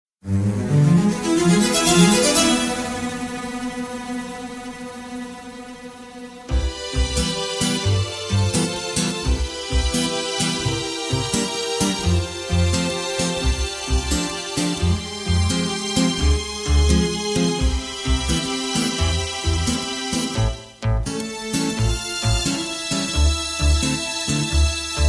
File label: C No Backing Vocals, backing tracks